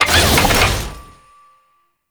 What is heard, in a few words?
Customization UI Close; Veilbreaker; Kahl